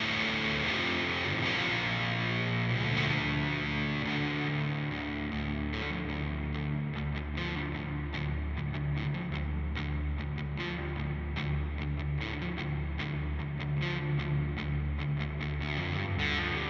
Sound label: Walrus Audio Melee R D 2